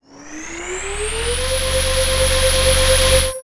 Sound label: Clock Tower Twisted Hall; Walking through the twisted hall to the Clock Tower